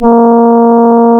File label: A#, Synclav FM, BRASS; Big Fish Audio